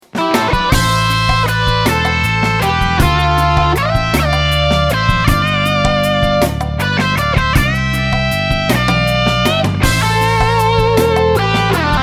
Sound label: patch demo